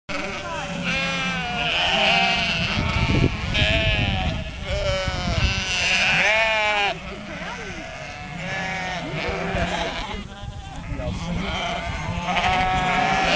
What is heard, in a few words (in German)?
heidschnucken